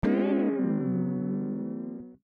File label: alien music